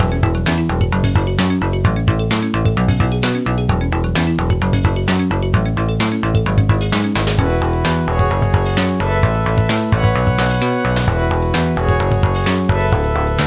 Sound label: Seeking; Blox Hunt; Roblox